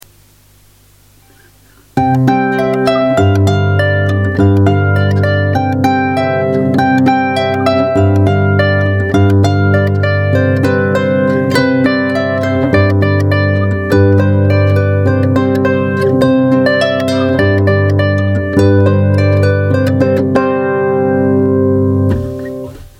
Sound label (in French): polka complet